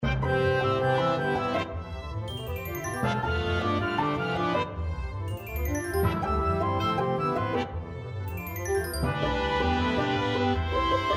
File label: Fanfare